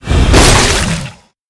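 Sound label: attack; grell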